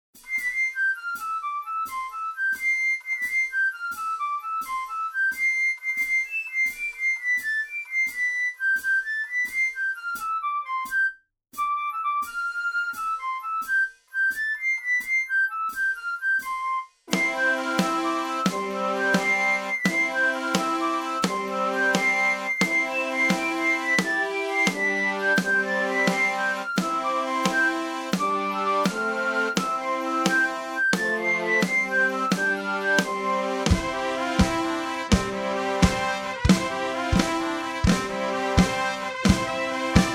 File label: fife drum